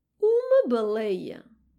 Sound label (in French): baleine